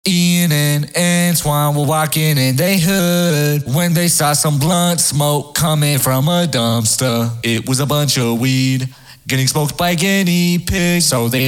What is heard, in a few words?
guinea pig acapella